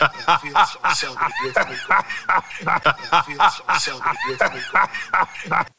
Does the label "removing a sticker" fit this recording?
No